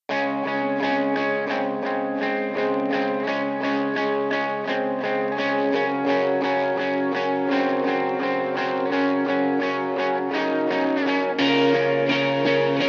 indie

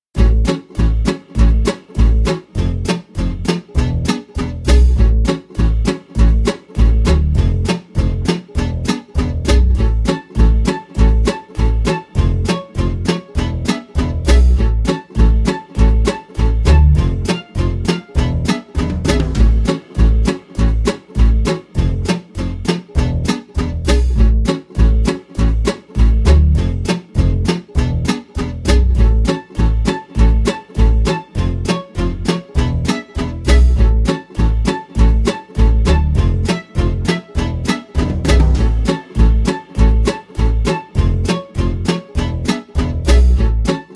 Swinging City without lead

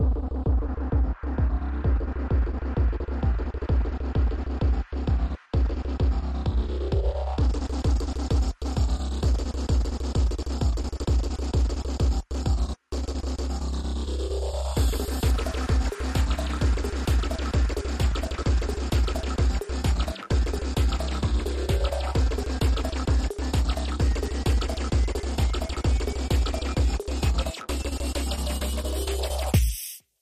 130bpm Alt Percussion

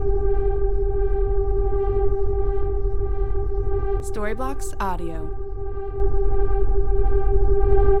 Ambient House Synth 120BPM C

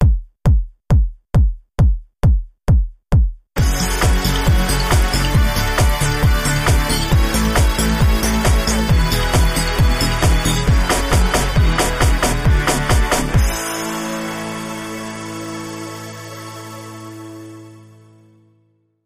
party machine

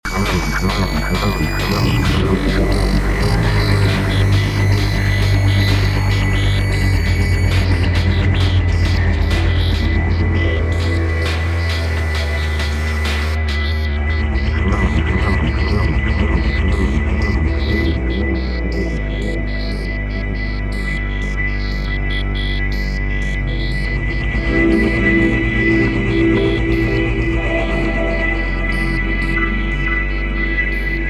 scream subland

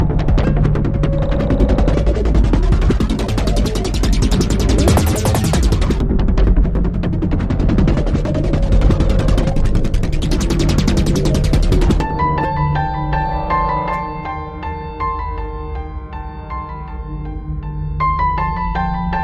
Terror Dubstep Glitch Mix

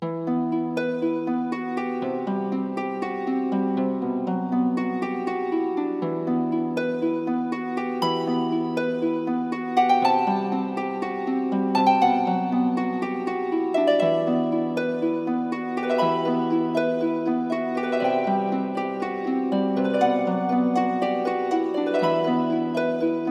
celestial harp melody

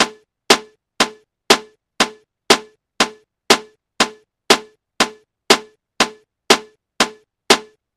Ambient House Top 120BPM

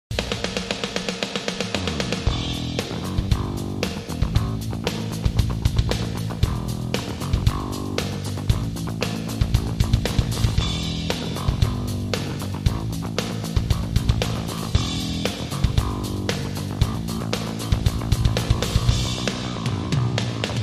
going good drums bass

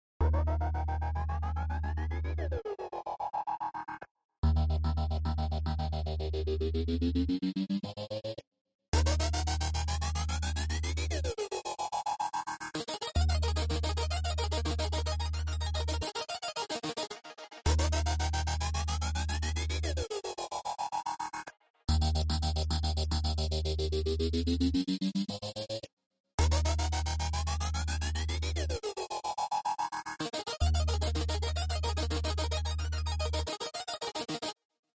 House Synth 110bpm

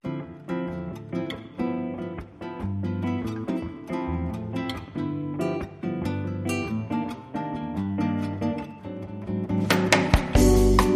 brazilian seashore

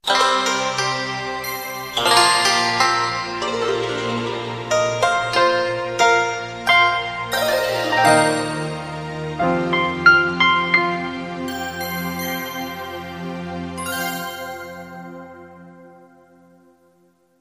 oriental interlude

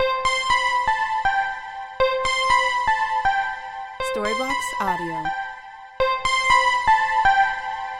Ambient House Lead 120BPM C

